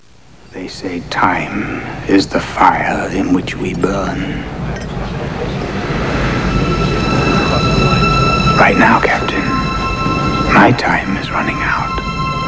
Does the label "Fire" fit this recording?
Yes